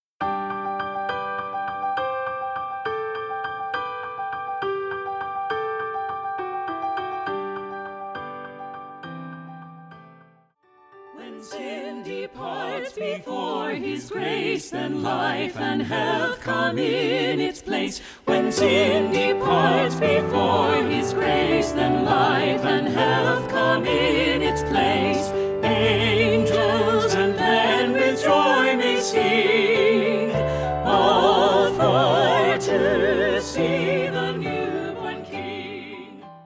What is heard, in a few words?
Sussex Carol Tenor